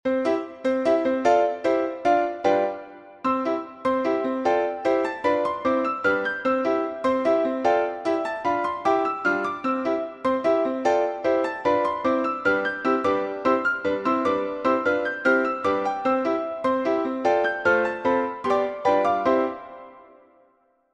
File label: Piano